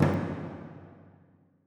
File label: left ghost, alfaia